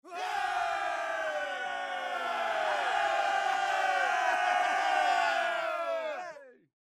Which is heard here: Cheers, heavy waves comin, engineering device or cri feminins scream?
Cheers